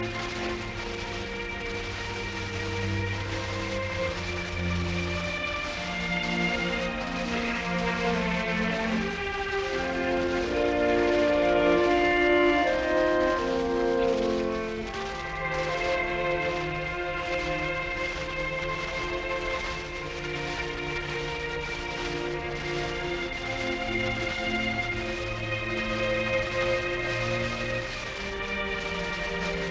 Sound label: Symphony in D minor Choral Adagio molto e cantabile; Symphony No 9; Op 125; Arturo Toscanini